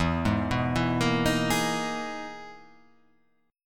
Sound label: E-Major Sharp 11th E